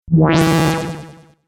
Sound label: Dubstep Audio Laser